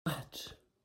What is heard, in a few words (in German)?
ratsch